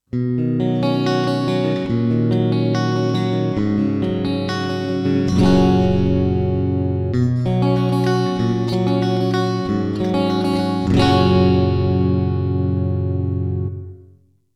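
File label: CLEAN Real Reverb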